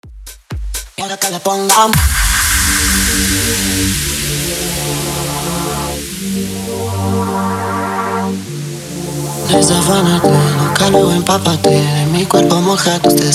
House Bootleg Rework 126 BPM

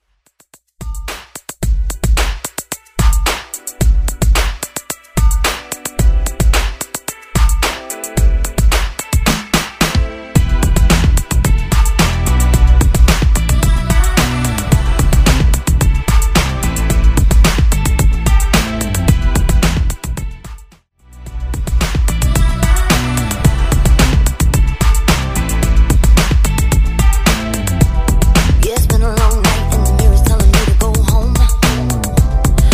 Top40 Redrum

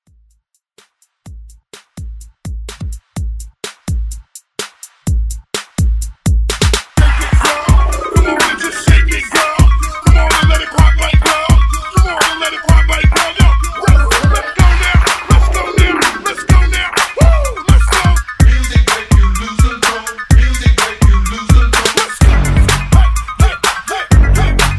Throwback Hype Redrum

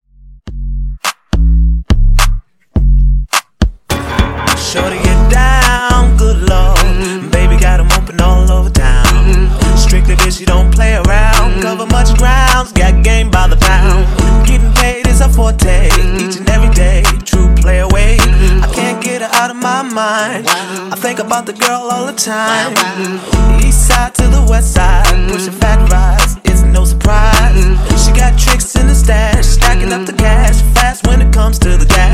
Intro Outro 105bpm

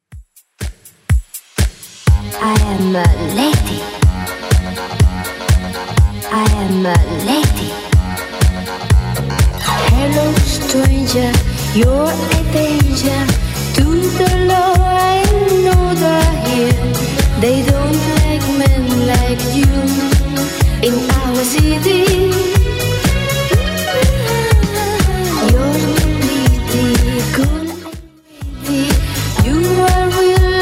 70s Dance 123bpm

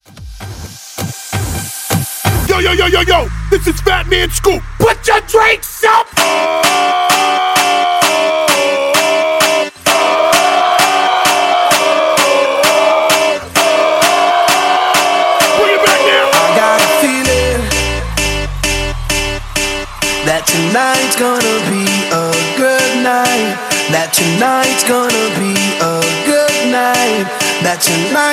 Dutch Hype 130 BPM; ReBuild

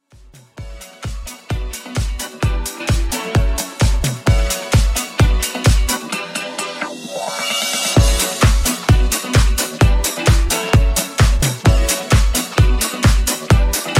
130 Bpm

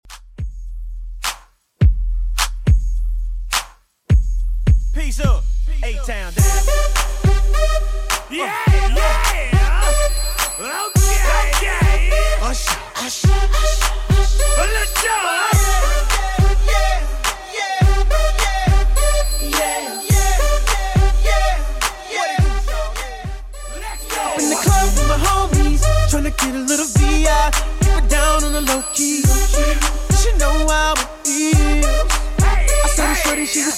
2ks Redrum 105bpm

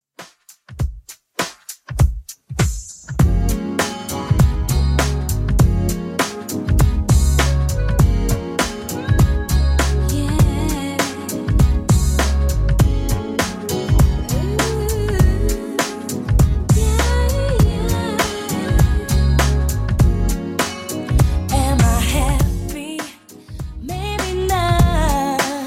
90s Redrum, 100 bpm

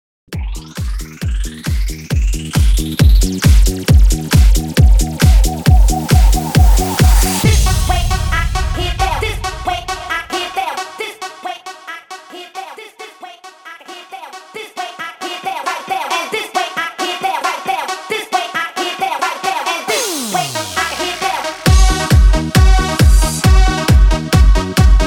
Intro 90s 135 BPM

